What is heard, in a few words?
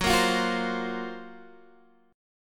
Gb Major Sharp 11th, Guitar DADGAD; GbM#11 strummed